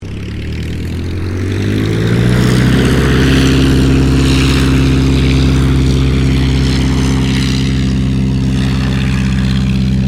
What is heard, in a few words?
AIRCRAFT DH82A DEHAVILAND TIGERMOTH TAKEOFF; RAAF Tigermoth; Airplanes